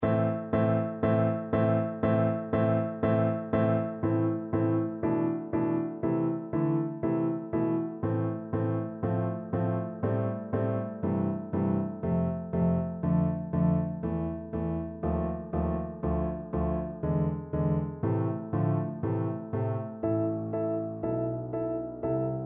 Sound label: faure elegie, Bassoon